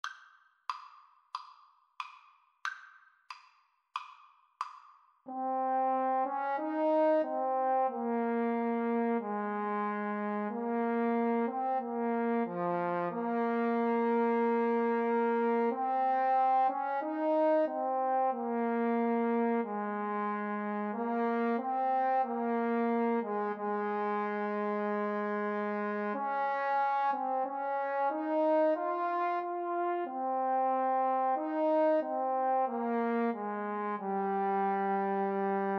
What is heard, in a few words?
Trombone Duet